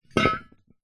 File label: metal heavy chunky item set down on hard floor; foley